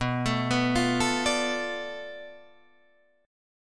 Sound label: B Minor 7th Flat 5th B Guitar Drop D